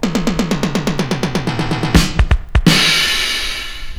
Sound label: DRUMROLL; MUSIC